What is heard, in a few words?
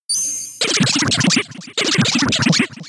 tickle; pokemon sfx gen 6 attack moves xy oras